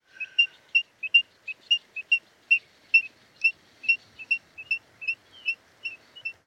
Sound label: Rufous chested dotterel